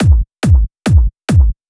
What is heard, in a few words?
Pitched Kick, 140 BPM; Vengeance Samples; Vocal-Hook Kits; Song Kit; Mr Big Boom